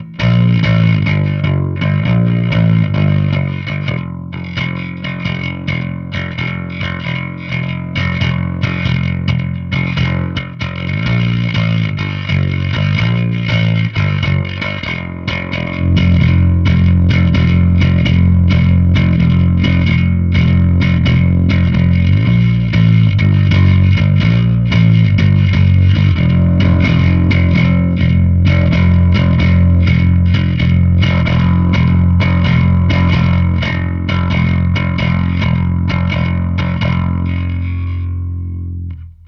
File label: acoustic